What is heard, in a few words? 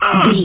voice male hurt pain